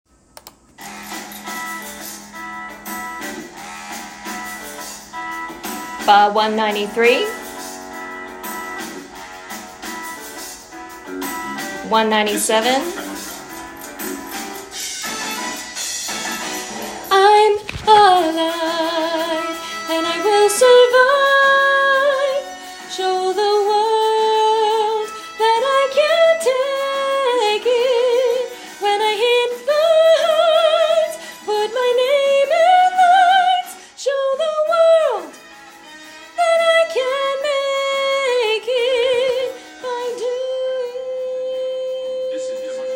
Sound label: Melody + Soprano; Fame The Musical - Holiday Pack